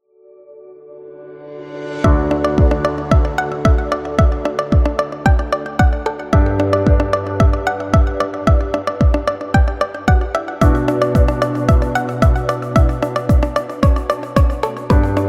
Innovation Technology Corporate, corporate music; corporate music vol 22

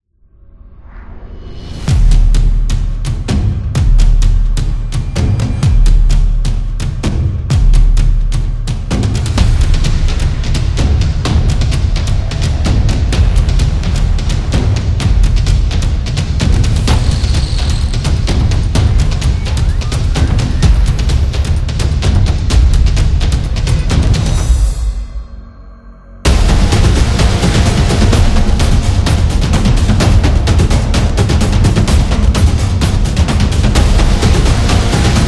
War Drum

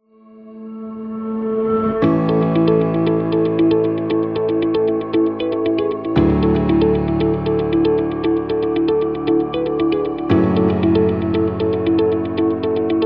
Dark Horse, corporate music; corporate music vol 5

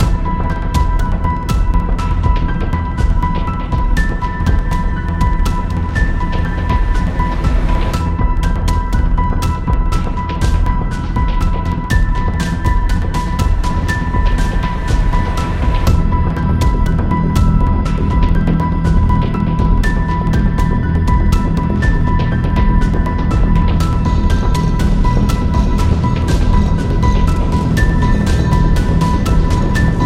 Horror Loop

